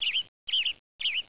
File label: bird; XDM; ambience